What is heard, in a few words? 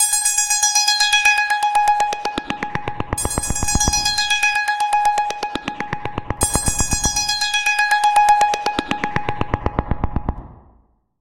3d business tone